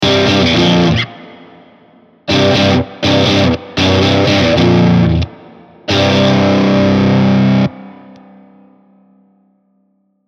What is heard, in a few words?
riff, OR50, classic taste; IK Multimedia AmpliTube Orange; AmpliTube-Software